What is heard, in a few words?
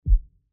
hover on